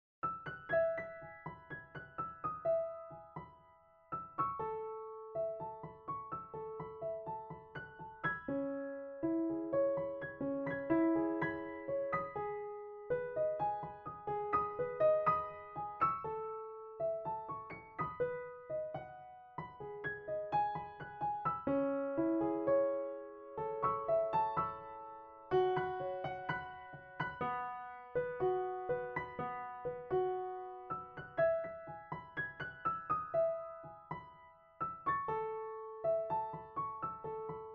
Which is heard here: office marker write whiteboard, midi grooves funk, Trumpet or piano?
piano